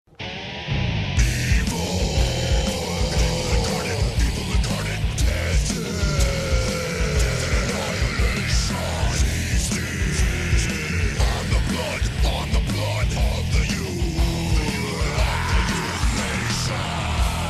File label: Chipmunk Metal